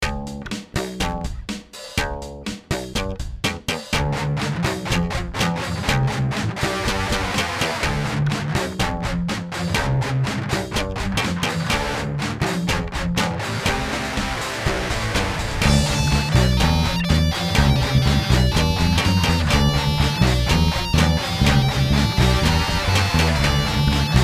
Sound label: rock